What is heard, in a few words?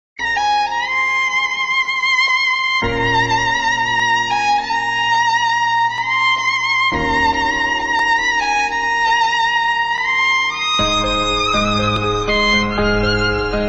violin instrumental music ringtone